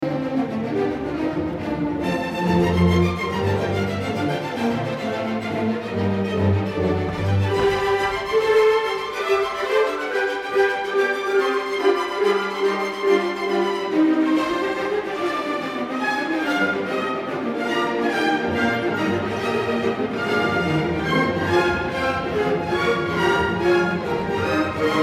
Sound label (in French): fugue instrumentale; double fugue; un puissant maelström contre-posant au thème de la Joie un deuxième, dérivé du premier; Beethoven